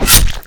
attack; antimage

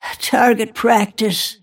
last hit pain; snapfire

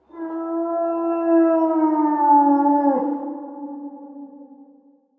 wolf; dota; ambient; soundscapes; diretide

